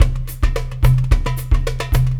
Acoustic Drumloop

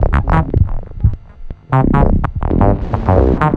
Synth Bass

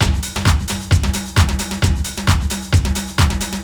Dance Drumloop

